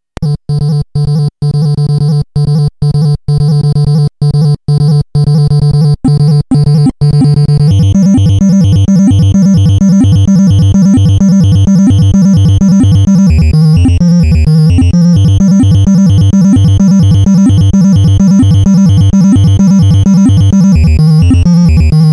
bass; blaster master area 1

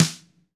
loose skin mute

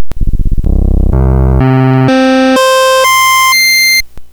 pulse; lbp

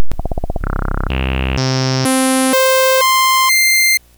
saw; lbp

